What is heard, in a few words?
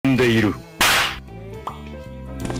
slapy